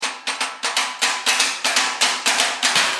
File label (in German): blechsteigerung